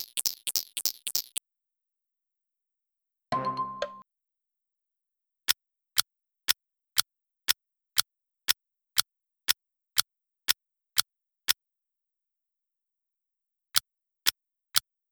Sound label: clock sample